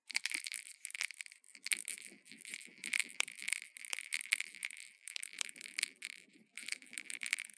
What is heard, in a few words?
small toy ball labhyrinth shaker sounds kitchen